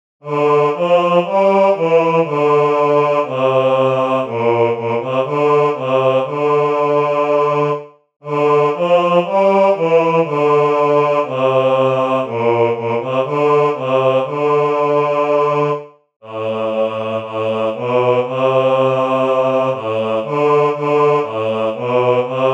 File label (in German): Noel Nouvelet Alt Bass, Midi; germersheim; Weihnachten